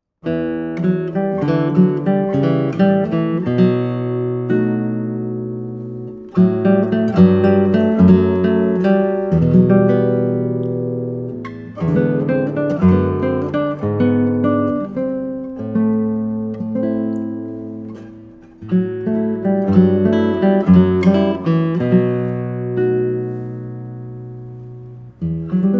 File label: classical guitar